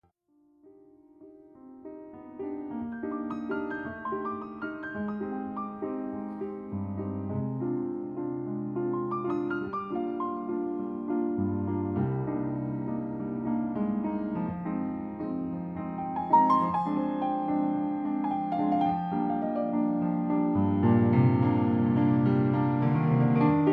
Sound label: Melodica Dedicada